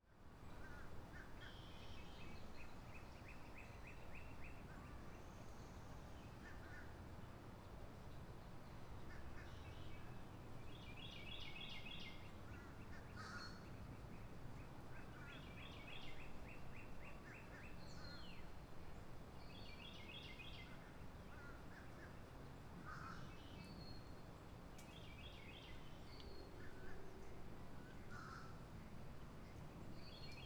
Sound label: Urban Apartment Nature Trail Day, forest ambience, Central Florida; Sound Spark LLC